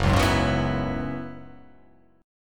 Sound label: F sharp 7th Suspended 2nd Suspended 4th F sharp down